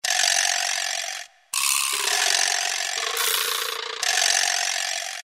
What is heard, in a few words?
vibraslap, Latin and F.X. Percussions; Roland SN-U110-02